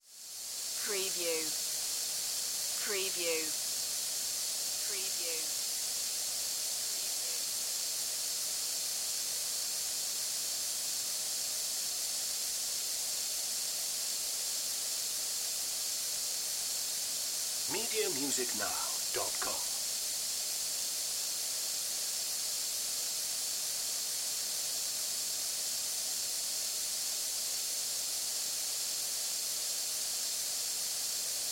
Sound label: Steam